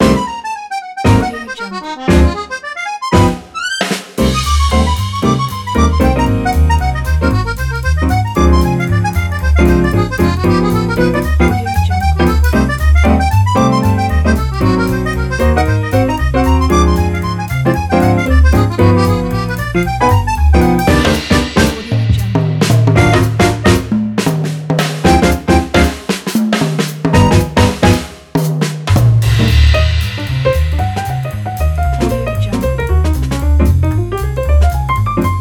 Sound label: street jazz band